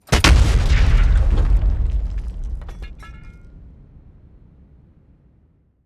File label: artillery shoots; fight